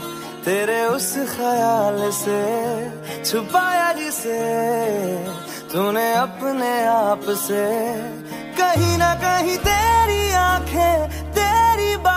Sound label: punjabi ringtone